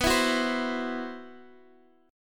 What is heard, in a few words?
C Major 7th Flat 5th B